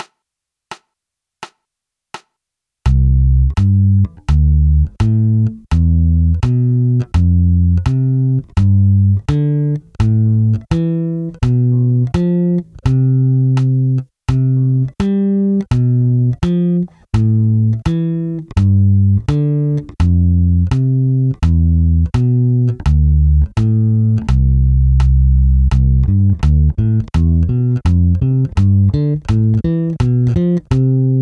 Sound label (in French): Quinte Etude 84 Bpm